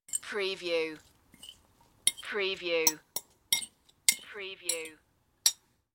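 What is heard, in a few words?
Silverware Bowl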